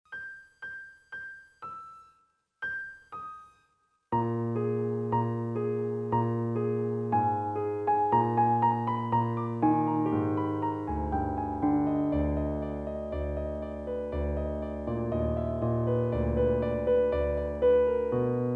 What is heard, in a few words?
Piano